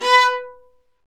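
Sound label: Marcato, Strings; Vlas Marcato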